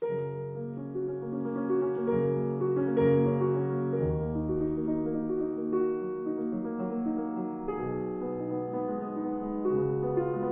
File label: Impromptu Noise; Schubert